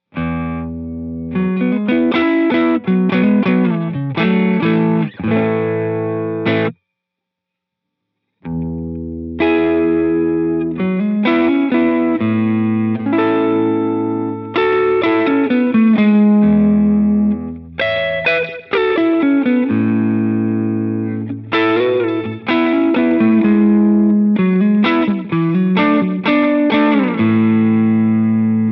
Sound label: Tape Echoplex